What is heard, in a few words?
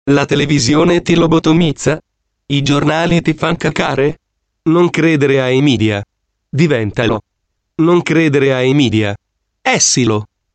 Jingle; essilo